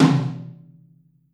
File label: Drums Percussion Kit1 Room Mic